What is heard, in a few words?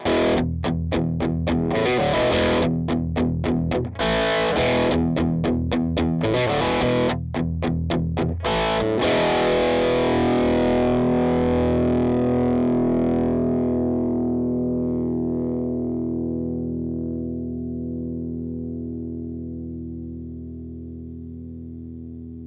Distors G50 9m, Distors G30 Cable Tone 9m; Line 6 Relay; wireless pentru chitara de la Line 6